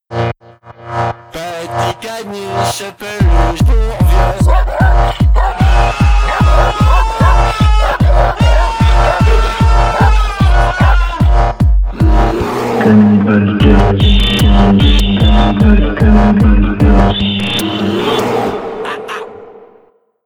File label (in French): jingles; peluches pour vieux